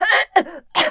choke; PC Gamer IT CD 41; Daikatana; mikiko

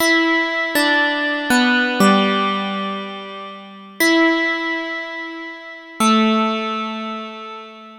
synth; Evolution

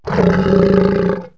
snort; animals; Wired for Sound Pro CD; Aristosoft, Inc.

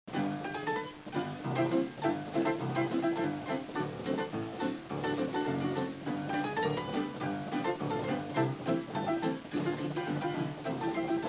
piano mix

